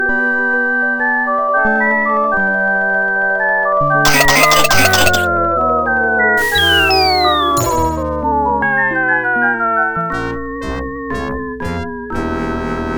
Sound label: adlib; DREAMM